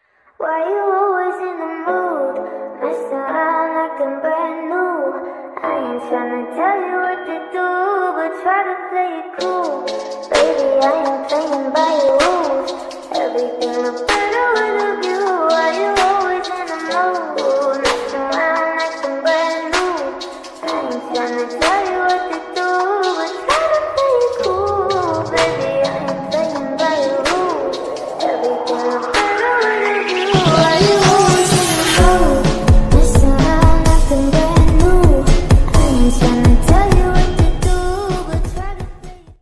cute reverb version slowed reverb ringtone

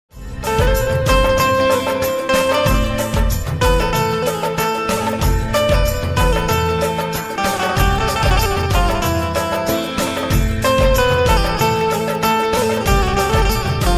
desh bhakti ringtone; 15 august special; happy independence day

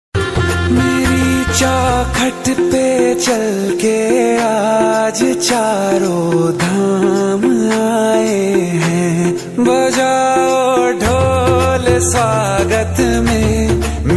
jay bheem ringtone; jay bhim

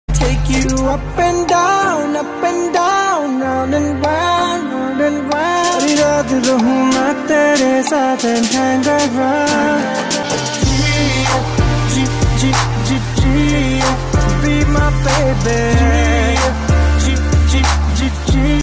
song music ringtone

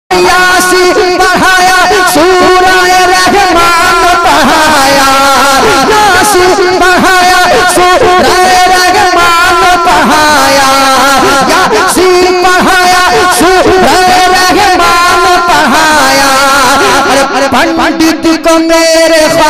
naat sharif ringtone, Islamic tone

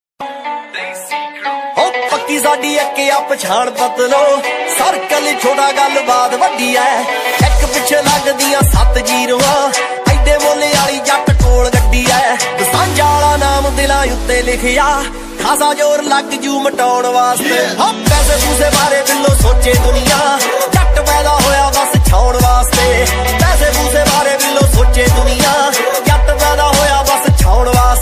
punjabi song ringtone

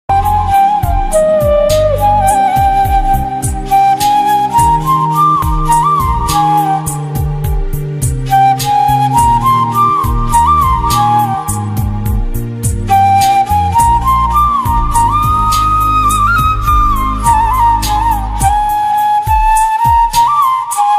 instrumental

